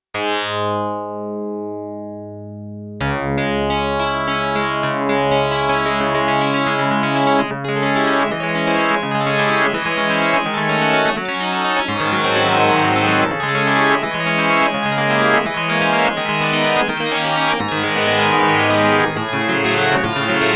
VZ PIANO

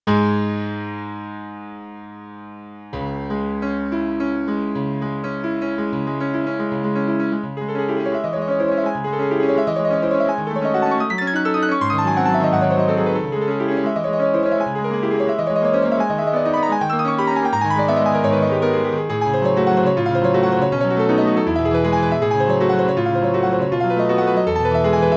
U-110 AC Piano

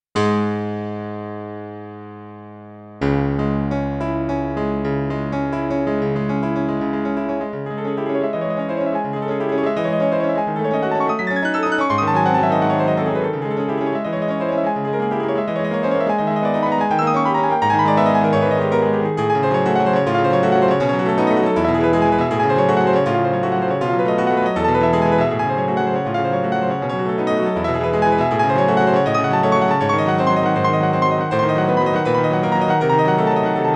P-330 CHO ON, piano

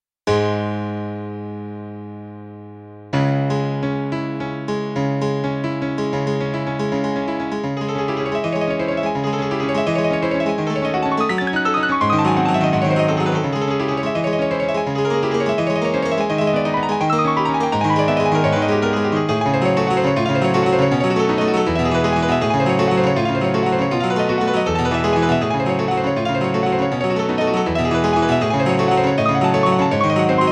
Grand Piano; WaveStation4-4

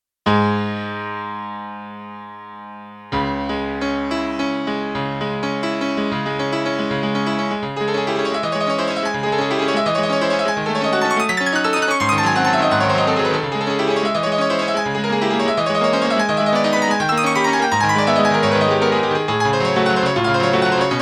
ff Piano

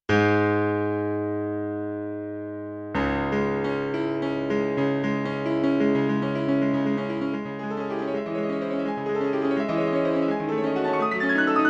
TX1P Piano

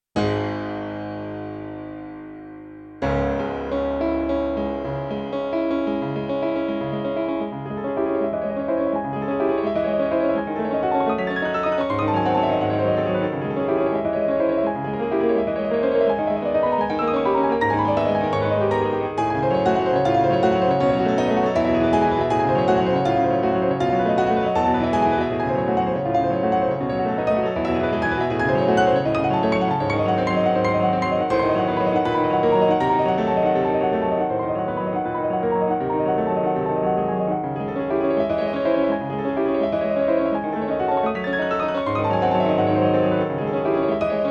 SonicCell SRX-11 Velocaver Piano

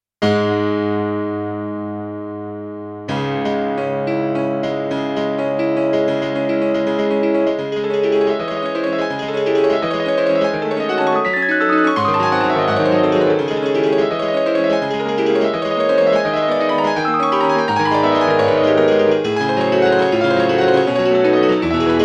Rock Grand; k4r IA-2

